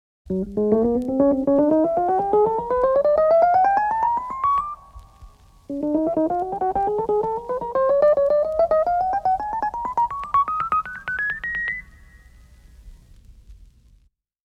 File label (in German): Musikeffekte Keyboard Tonleiter